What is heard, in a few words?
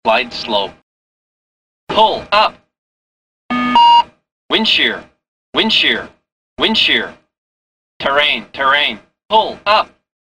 GPWS test; GPWS-test-short